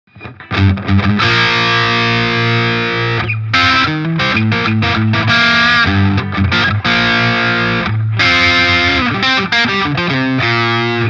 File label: Small Box Overdrive Low Gain full Gain; Friedman